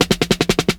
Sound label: Sn roll; Drumdrops In Dub VOL-1; SINGLE HITS